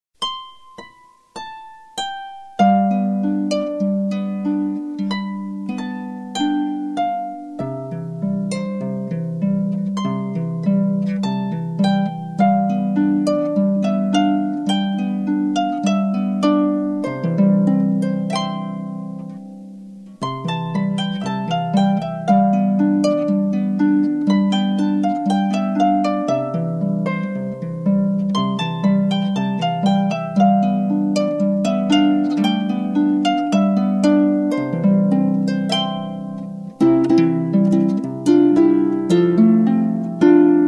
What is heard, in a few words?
Waterfall